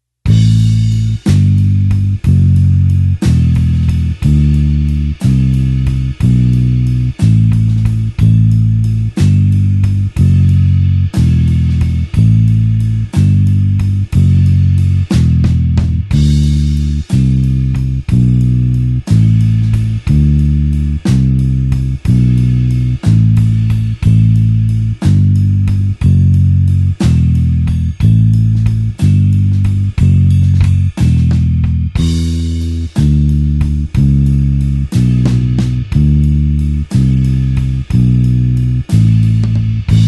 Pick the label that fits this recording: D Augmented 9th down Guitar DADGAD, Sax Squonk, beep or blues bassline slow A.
blues bassline slow A